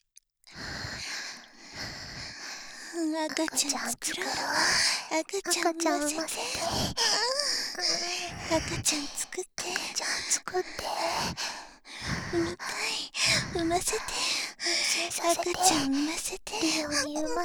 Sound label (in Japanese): 喘ぎ, バイノーラル; 赤ちゃん作ろ♪; ハーレムオナサポ 4人のメイドがご主人様のオナニーをお手伝いするバイノーラル～文、千曜、名月、莉桜～